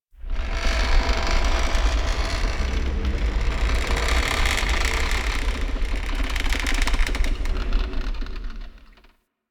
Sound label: Asteroid Big Ship Groans; Ambience; Warframe; Grineer Asteroid